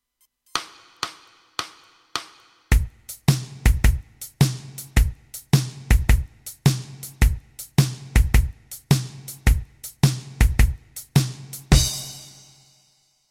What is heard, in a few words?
blues shuffle